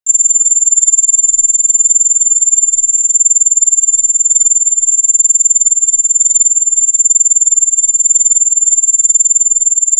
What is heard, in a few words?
firing phasers